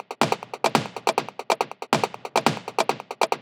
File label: Top Loop 140 BPM, psytrance; Loops